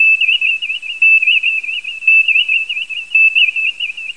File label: Main Viewscreen; Star Trek JR Audio